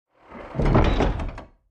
Truck Back Doors closing

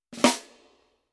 Short Snare Roll

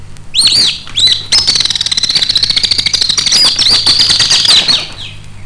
Martinet bird

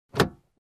Car Door Handle

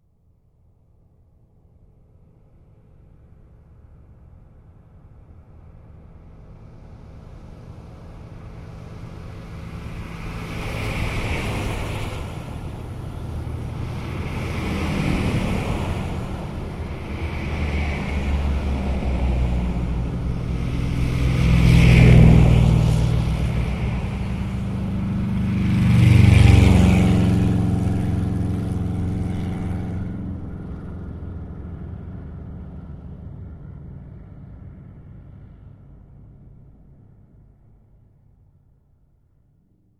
Cars Passing by in main road

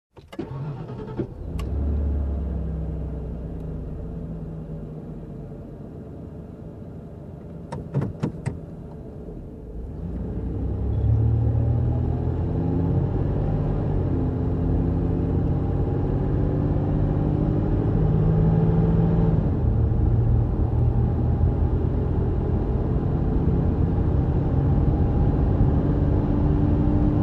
Road trip in car

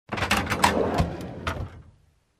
Rattling drawer open

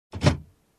Opening Car Compartment

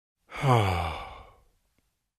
Male Sigh

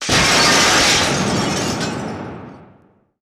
Glass Shattered from minor explosion

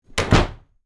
Door close

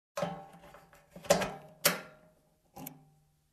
Securing Bike in Garage

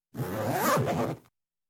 Zipper sound

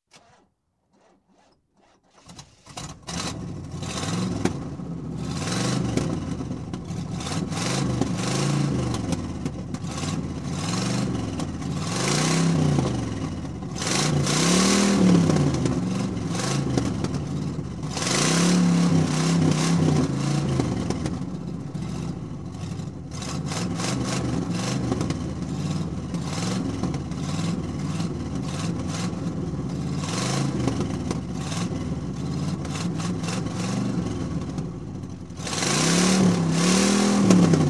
Car engine sounds

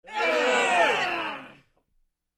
Crowd Disgust reaction

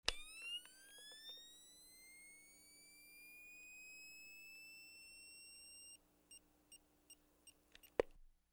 Voltage Charging sound

